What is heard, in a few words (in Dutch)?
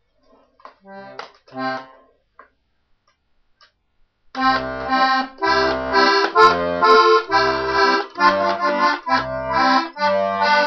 Altijd is kortjakje ziek, harmonica